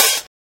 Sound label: clean open hat one shot g sharp key, Royality free open hat sound tuned to the G# note.